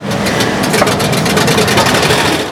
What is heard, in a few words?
production aircraft; Hearts of Iron IV Death or Dishonor